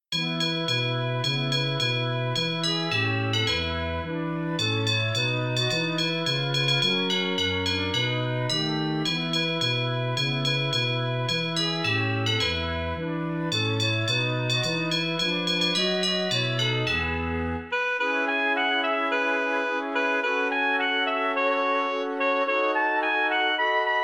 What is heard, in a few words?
jingle